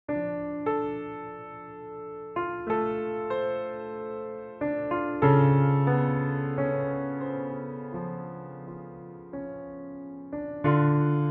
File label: Piano Dramatic